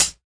hat; Hats

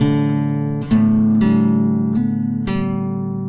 open guitar notes; GUITAR LOOPS

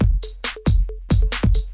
136 bpm super sonic, drum loops and breaks

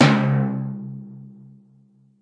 Timpani, Roland TD 7; drum machines

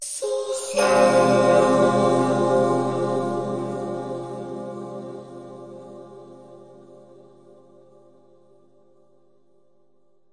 high elves chord, vocal; instruments single samples

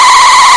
skidding; VIDEO GAMES; turbo

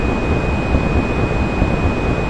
flight; tacscan

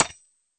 Fracture Hi Hat, Found Percussion Hits; UNUSUAL KITS and FX; ModeAudio

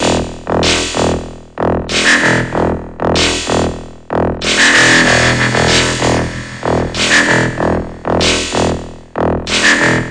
shortverb bass nightmare, bass loops

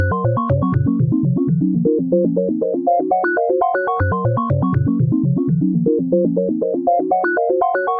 120 pretty arp climb up; SYNTH AND ELECTRONIC LOOPS

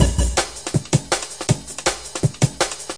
161 dnky brk, drum loops and breaks; 161 to 180 bpm

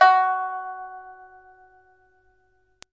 koto sounds; WORLD

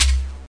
distorto shaka hit; Western and Latin Percussion

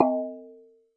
Processed Ethn Perc, Doru Malaia Percussion

